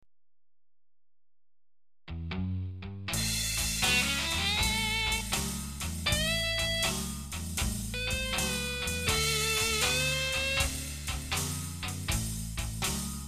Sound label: Blues